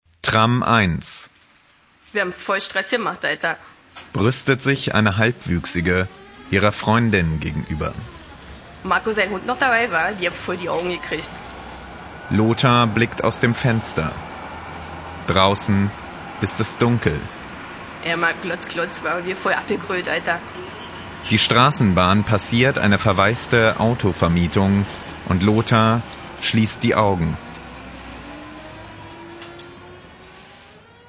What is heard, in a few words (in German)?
tram